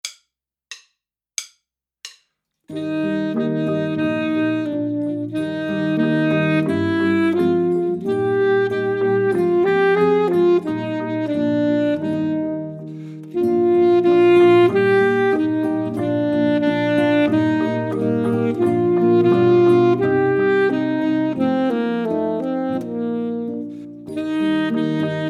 Full Sax

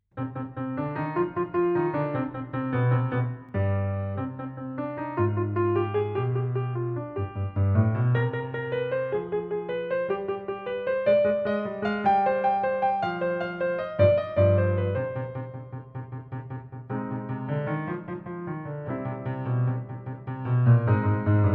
piano

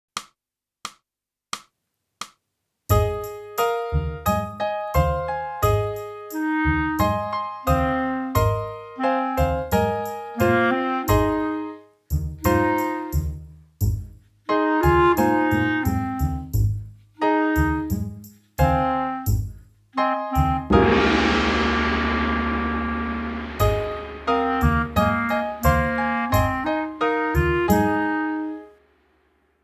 clarinet; boosey method

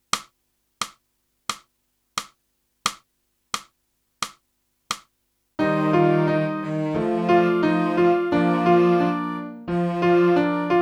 sax

